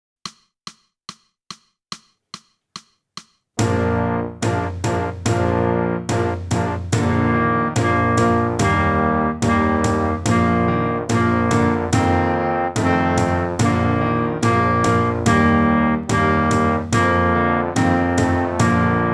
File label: clarinet; boosey method